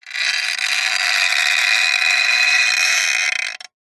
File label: metal stress creak thin tone; zapsplat